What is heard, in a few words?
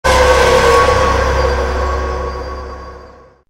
scare; music; smokey; scp cb; 173; slender